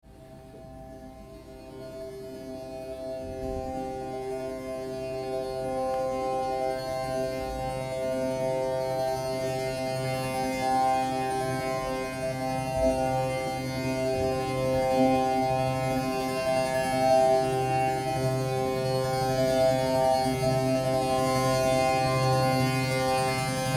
monochord 16 saitig c

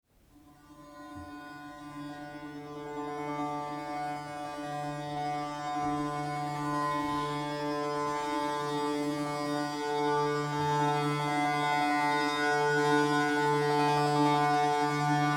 Woelbmonochord Monochordstimmung d

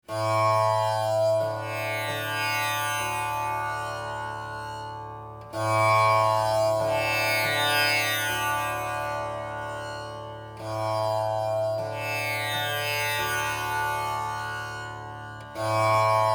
Bambustambura Stimmung a d d D

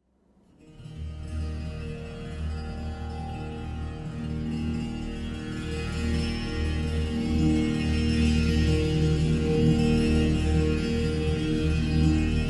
Bass-Körpertambura, Stimmung B – es – es – Es (EsEs), stetige Spielweise; Körpertambura® (Body Tambura)

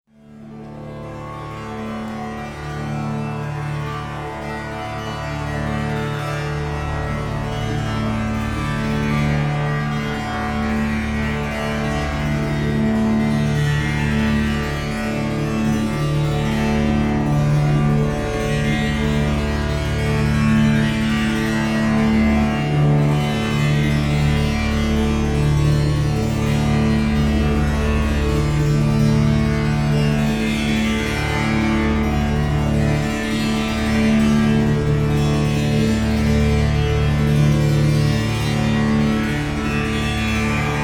klangstuhl 64 saitig

